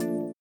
VIBRA; Spectrasonics; Metamorphosis

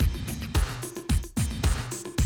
drum loop, 100 BPM

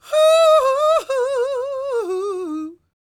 CROON, Vocal Planet Blues & Soul; CW SOUL

